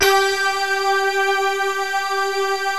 MYSTERY SYNTH

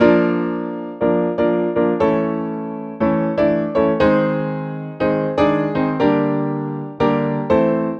Piano; Dr. Fink's Funk Factory

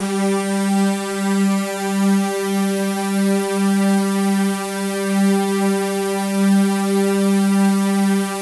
SIZZLE G4, SIZZLEPAD; Drums In Your FACE!

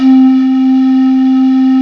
PAN PIPE

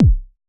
kick, Roland MC-909

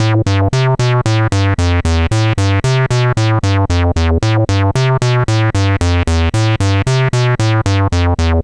C64 Bass, Commodore 64, Chiptune

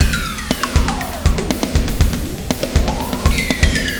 120 POWER, drums

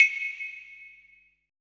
HI BLOCK, PERC; BPC PERC

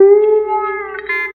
Guitar Lick; Zero-G Jungle Warfare 1, 2 & 3

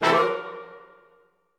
orch sting; musicradar; cartoon caper

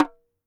CLAY FRAM, CAMEROON

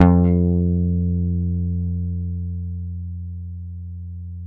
F 4 JAZZ GTR; Univers Sons; Jazzistic

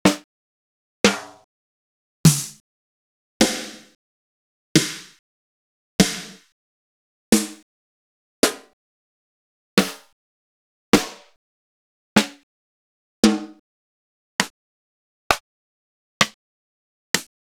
R8 Acoustic Snare; X-Static Goldmine

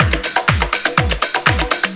Bongos lp; Urban Shakedown

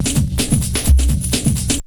Bite Size Beats; FM 57 - The Ultimate Sample Collection (1997); Essential beats

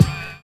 TRIANGL

